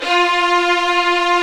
STR, Vls fff; Roland L-CD702

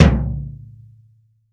BD, REAL DRUMS, JUNGLE KIT

